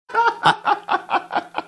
laughing in background

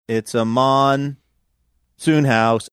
singing it's a monsoon house

